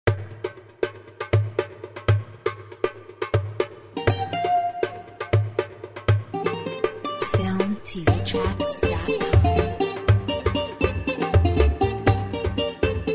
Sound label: Greek Dance darbuka